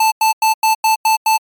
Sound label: alarm clock